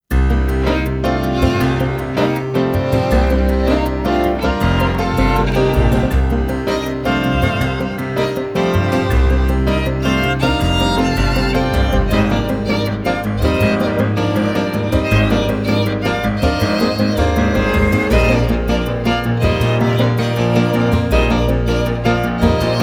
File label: Jazzy